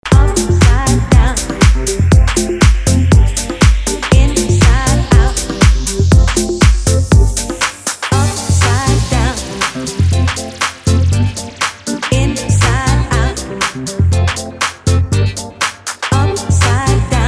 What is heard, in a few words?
Classic 80s Party Banger